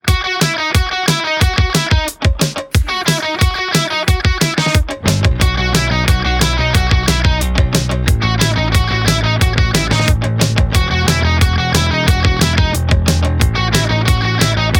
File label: High School Rock